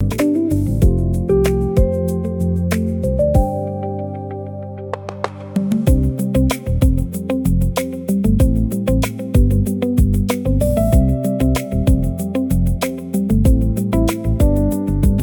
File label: loop